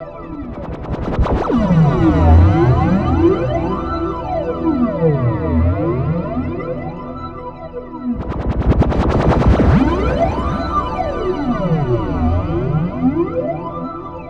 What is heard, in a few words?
Guitar Textures